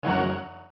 combo; Puffer Popper

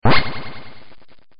sound throw

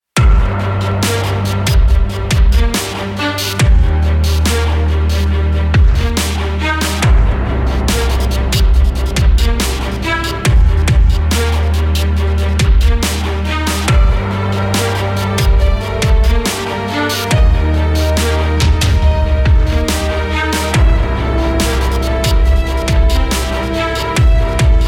music Epic

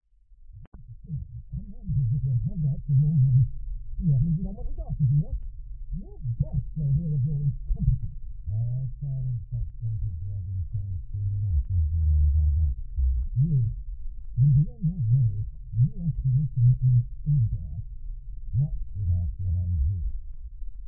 muffled; bow-street-runner

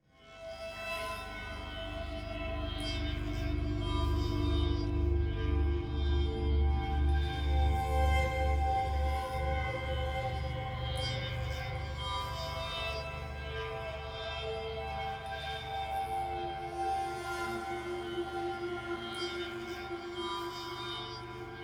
music deer

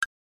attack

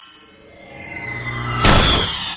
enemy strong attack

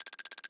propeller fast; CrossCode

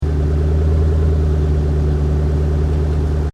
mustang idle; fordmustang

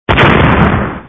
splosion; demolition 2

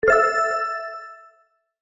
success

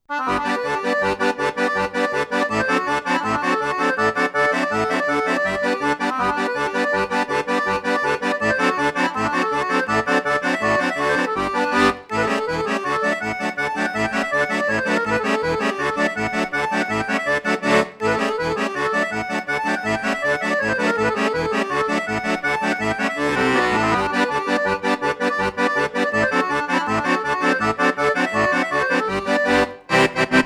Clarinet Polka

